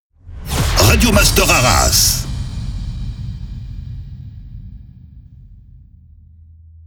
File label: Sweeper SPEED; Radio Master Arras; Habillage